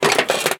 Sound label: Metal Sheet Move